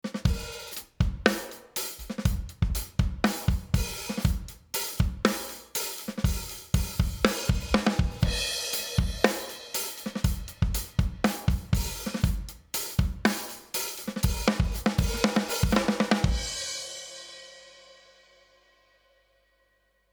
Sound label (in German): Drums Kompression 4 zu 1